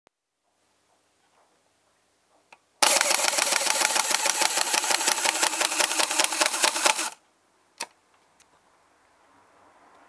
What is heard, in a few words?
robot